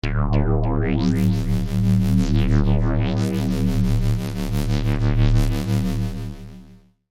UFO Bean Ray, Scifi, Ufo, Bean, Ray, Vintage, Science, Fiction; Spacecrafts; BlastWave